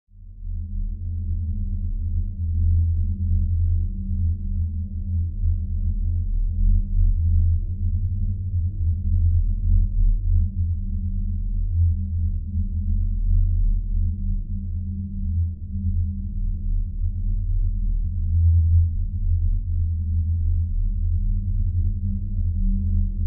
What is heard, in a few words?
Bed Choral Drone Hum